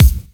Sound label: KIT, Dance Mega Drums, HIP; Best Service